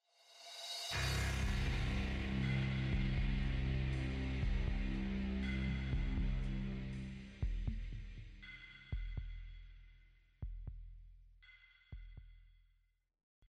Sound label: death music; maxpayne